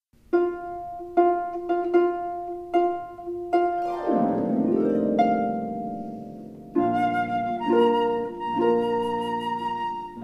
Bridal Chorus with harp, Flute

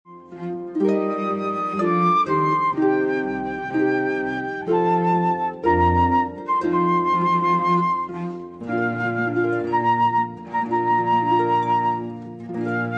Harp Flute And Cello; Bridal Chorus (Here Comes the Bride); Harpworld

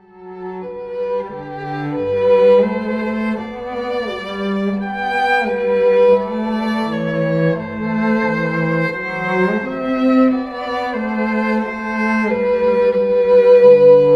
Violin Cello Duo

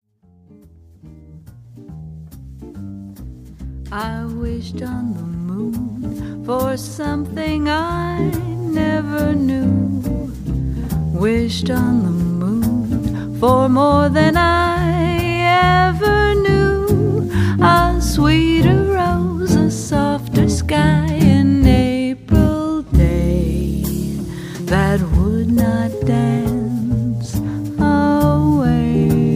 Jazz Band

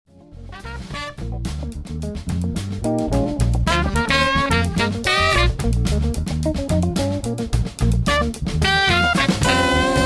funky jazz, Jazz Trio

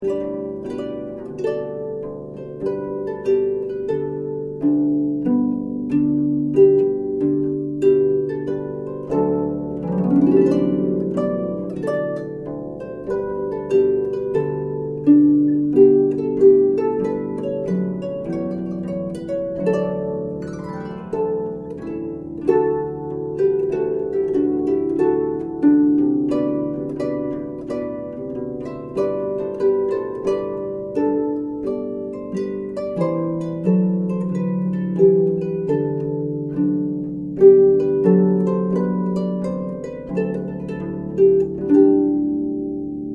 Trumpet Voluntary, harp; Clarke; Leslie Adair

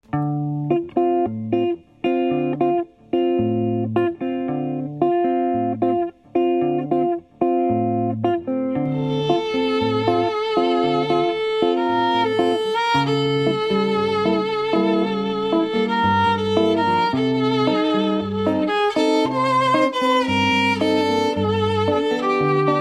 Violin and Guitar; Classical

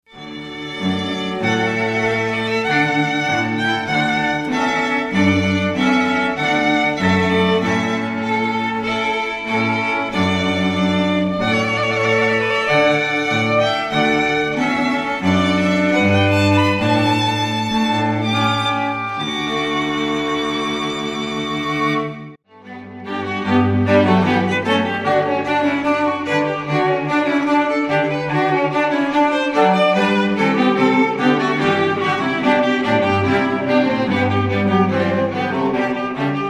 Trumpet Voluntary Brandeburg Medley

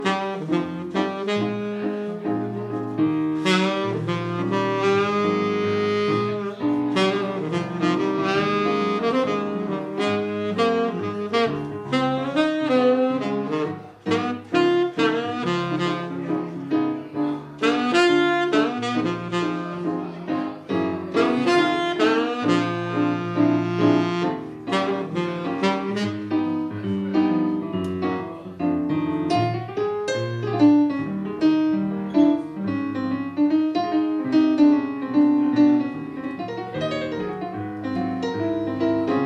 Duo, Jazz Band

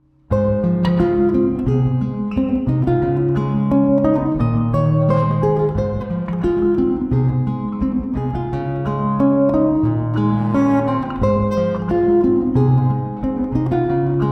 classical guitar

